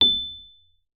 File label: RHODES MK1P A7; Xsample VOL-1